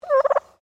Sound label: Bird Turkey Domestic Call; Birds (Sound Effects:Animals)